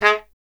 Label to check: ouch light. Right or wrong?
Wrong